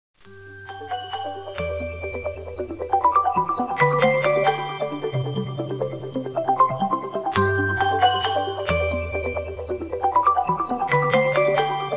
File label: Huawei Mate 40 Pro Aegean, ringtone